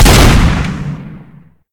shotgun fire; deluge; weapons v3

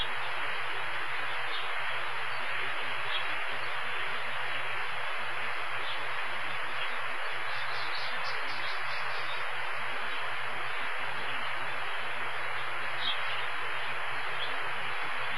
outside; cs jackpot v2

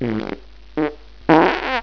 fart

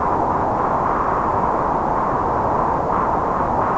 wind creepy

